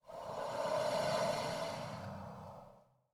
low hiss, alien voice

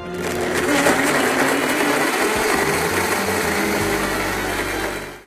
blender, machines; Polaris

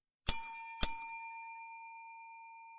service bell

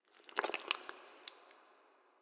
hatch, alien

